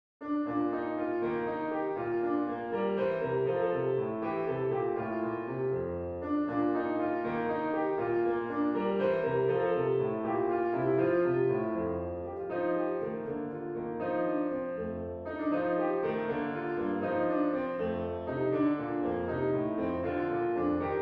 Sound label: gigue